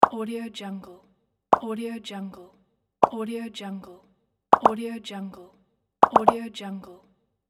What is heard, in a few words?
mouth pops